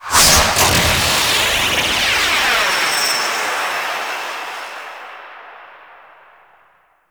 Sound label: Comic Whip Jet; Warframe; Yareli Quest